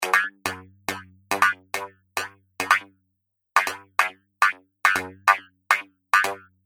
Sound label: 140 Bpm Droid Boogie Loop, Robot